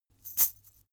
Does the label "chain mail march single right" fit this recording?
Yes